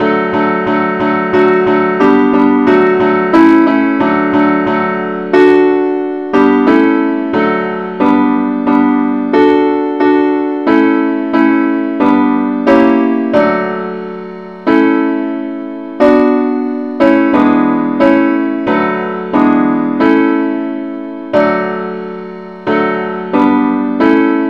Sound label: simple acc key d; Father, we praise Thee, now the night is over; christe Sanctorum